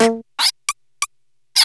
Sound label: Ratchet Noise; PC; MARIOTEACHESTYPING2